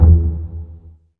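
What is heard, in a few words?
bass pizzicato E2, Virtuoso Strings; ILIO; Kirk Hunter Virtuoso Strings VOL-3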